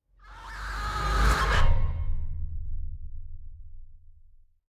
shock reverse; Joe's Diner

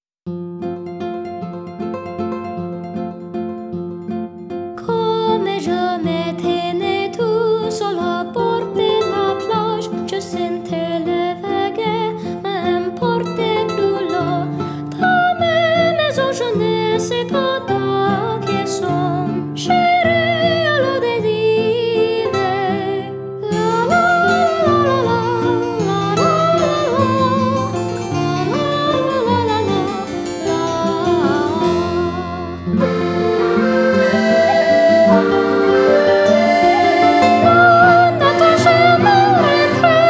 Small French Port; Pirates of the Burning Sea

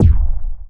Sticky Shot; Blastmorph Pinball; xbox series xs

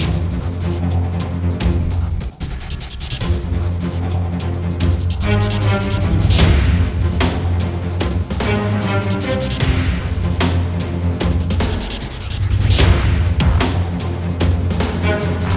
Epic Battle Looped; Dual Blade: Battle of the Female Ninja

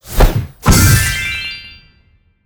Blaster Activate; Ellen's Garden Restoration; android

